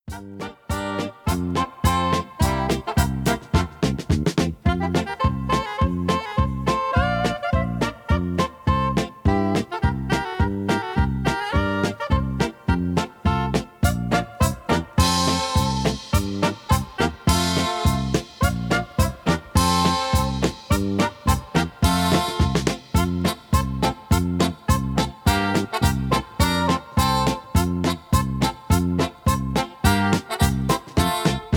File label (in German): zigeuner polka